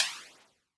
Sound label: level next; Dinothawr